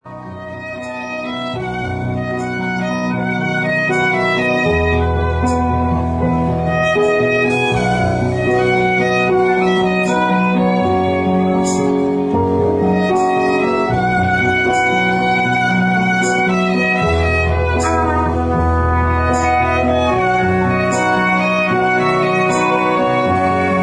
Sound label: instrumental